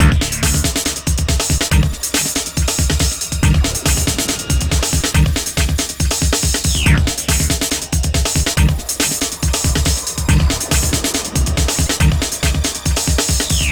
Med Groove 1 Hi; Unreal II The Awakening; Acheron

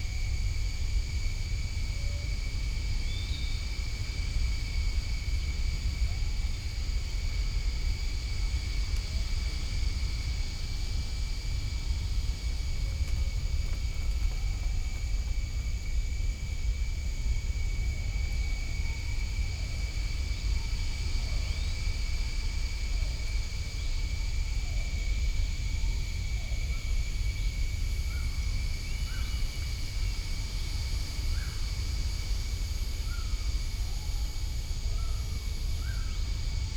Ambient

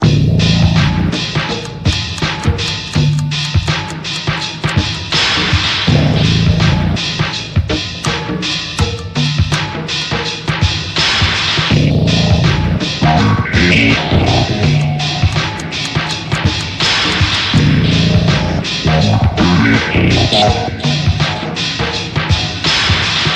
jingle map

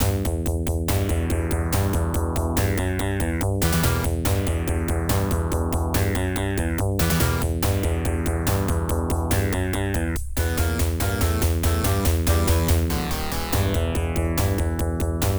Coal Mine; Guerrilla War; arcade

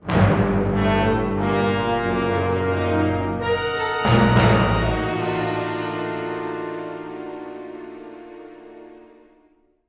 Droid Defeat

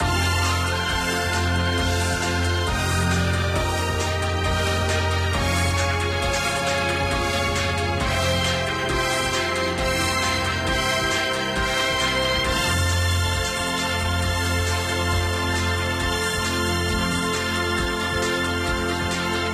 bgm gacha music; princess connect; android game music

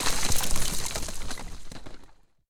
Explosion Debris; boomerang fu

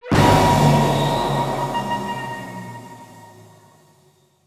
Music Stingers - Battle Stinger

